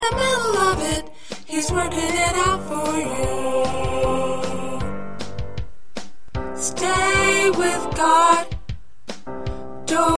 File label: P Alto